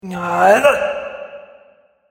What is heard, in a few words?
Martial Arts Yell "Niuuugh"; Combat Voice Sound Effects; Human Sound Effect